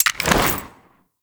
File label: Invisibility Throw; Warframe; The New War; Drifter; Abilities